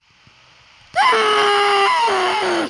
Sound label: Weird Scream